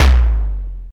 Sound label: tom, drum machines, LM-2